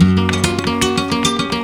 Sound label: GUIT SOLO; Best Service; World Colours